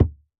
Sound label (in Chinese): 泥坯 踩踏声